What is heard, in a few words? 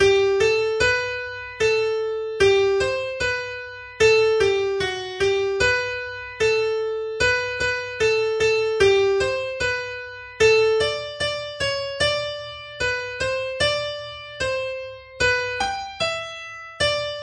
little slow; Ring O Bells